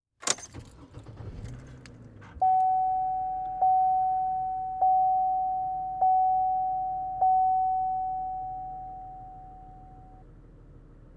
Transportation Chevy Trailblazer Start Rev Off Interior